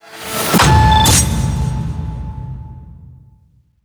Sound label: Shutter Time; Syssd Se Shutter Time; beatmania IIDX 22 PENDUAL; System BGM